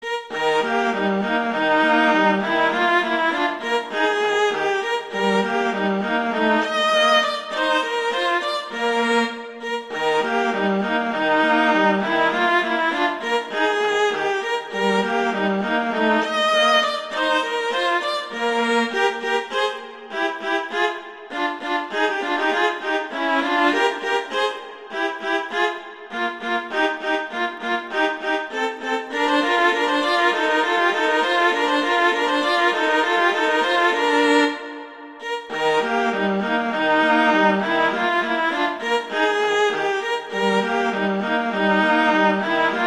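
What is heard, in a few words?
Duet, horn; Mozart; Duets; Vla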